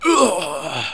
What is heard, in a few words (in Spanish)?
Soldado Muerte; Resurrection